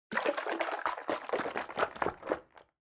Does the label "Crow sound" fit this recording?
No